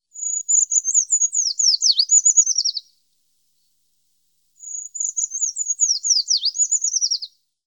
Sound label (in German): Waldbaumlaeufer Certhia familiaris; Vogelstimmen